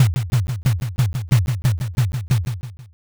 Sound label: bon taiko